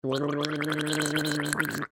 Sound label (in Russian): Poloskanie gorla